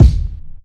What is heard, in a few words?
rich lows kick sound f key